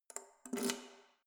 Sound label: letterbox metal insert key